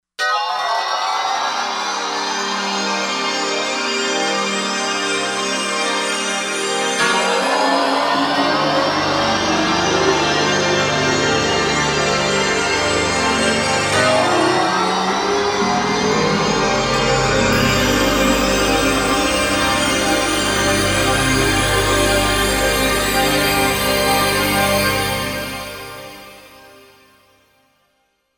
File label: Fx Rising High